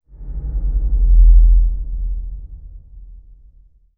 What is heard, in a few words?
Sub Sonic Stinger; Title Sequence Effects; Sony Pictures Sound Effects Series